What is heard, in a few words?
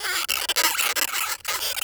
Forest Rodent Squak; Ambience; Warframe; Grineer Forest